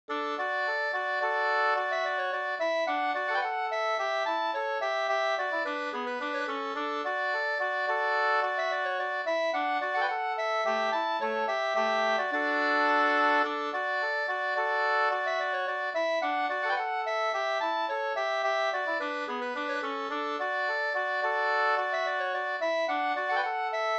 slangpolska

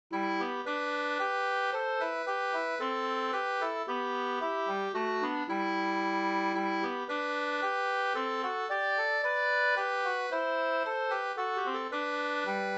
vismelodi, E moll; Visa fran Rattvik

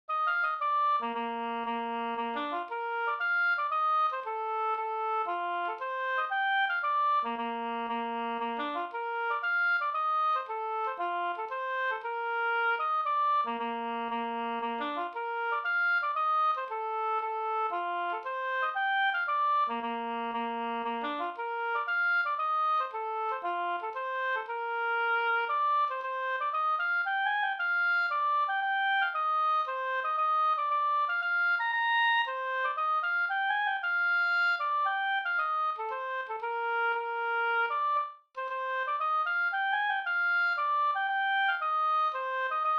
polska Brudpolska

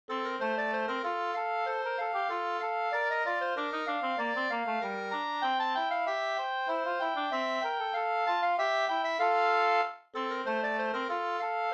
kadrilj; e Bjorkstrom Overselao Sd 2st

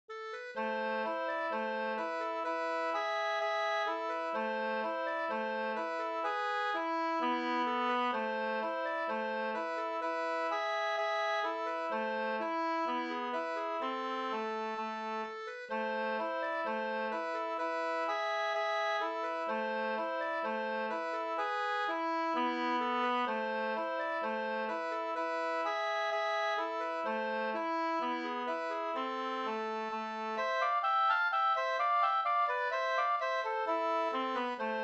ganglat Fabodlat; Lillhardal Hj

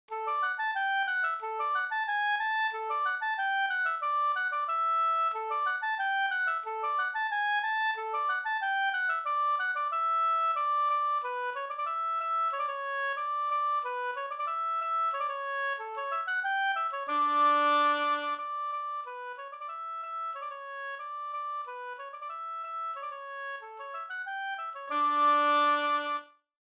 halling; e Lars Caspersson SvL Vr145

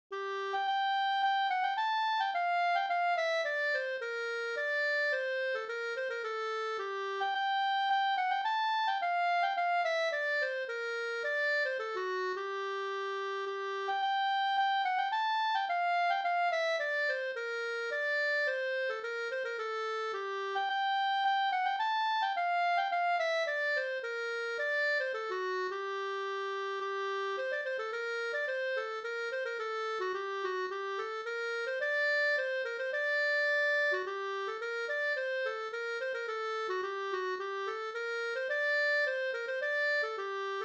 polska Bodapolska G moll

